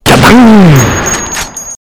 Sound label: gadang